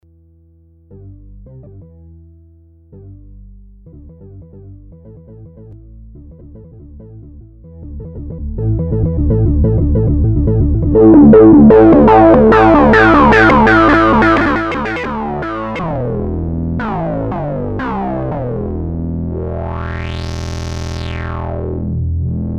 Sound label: Sub37